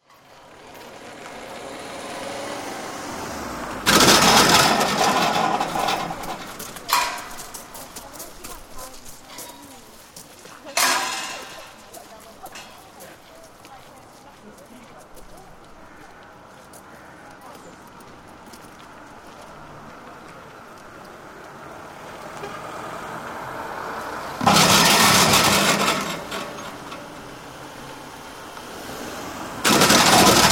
Sound of people walking through gate and cars driving over cattle grid; Country Park Entrance; Sound of people walking through gate and cars driving over cattle grid at country estate.; Outdoor